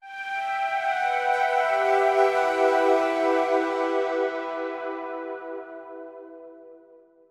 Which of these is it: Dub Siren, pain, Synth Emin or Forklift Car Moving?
Synth Emin